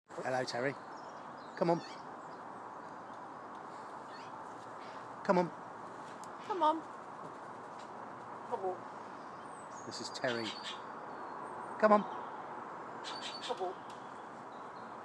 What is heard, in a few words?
corvus corax